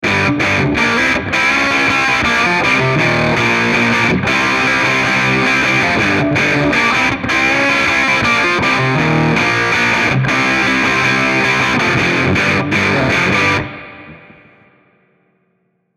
MF Drive Highgain

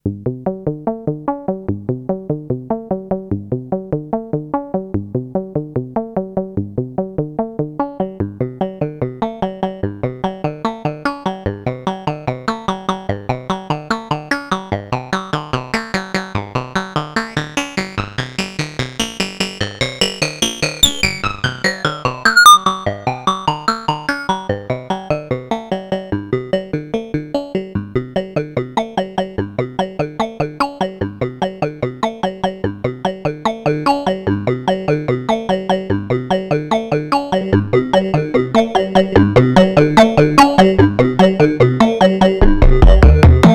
Formant filter XM

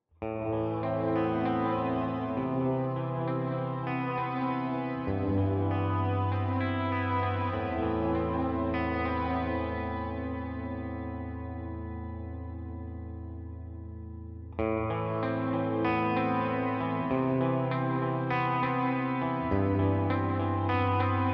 Nocturnal Shimmer

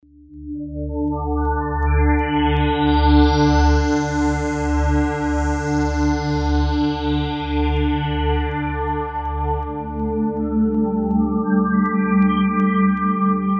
Spector

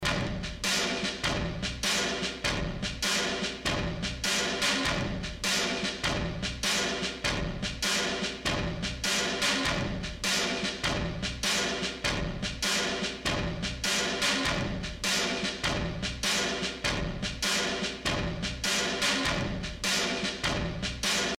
60s drums atomic Crunch

